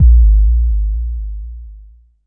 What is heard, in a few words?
Jomox MBase, drum machine; Jomox MBase 01